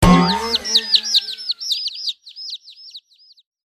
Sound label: dizzy bomb dizzy